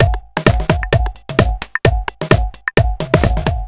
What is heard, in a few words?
Fill, Drum Loops 130bpm; Zero-G; Soundclash Nu Dance Electro; Acidized Wavs; Snap Crackle